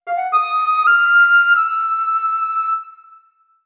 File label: flute